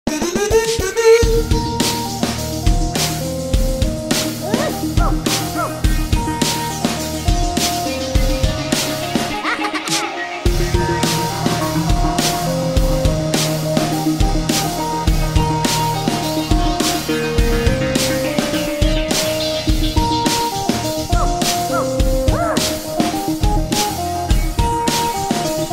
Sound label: Drive Music; bridge constructor portal; android